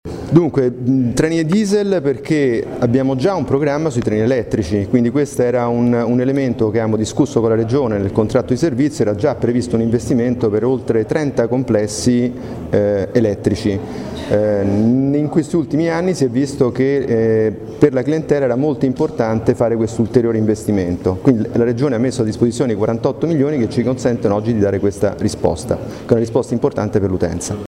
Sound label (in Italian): Soprano